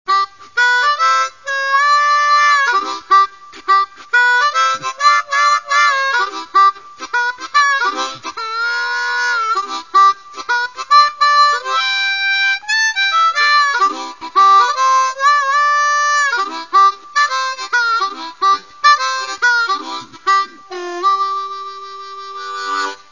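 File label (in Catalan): harmoni, instruments de vent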